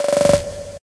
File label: alert, notifications; Android